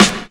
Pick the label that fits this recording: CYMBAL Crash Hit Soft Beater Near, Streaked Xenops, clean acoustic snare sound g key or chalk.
clean acoustic snare sound g key